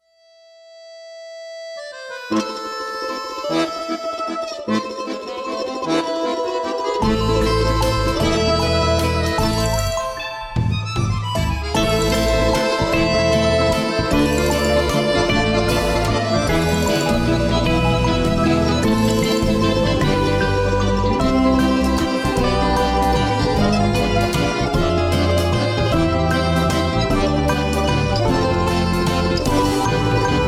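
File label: Accordion Music; There Is No Game: Wrong Dimension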